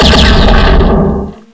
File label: blaster